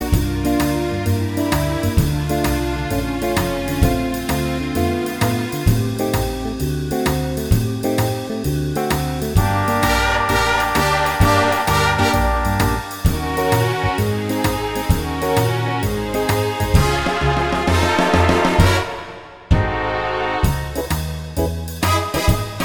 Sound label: jazz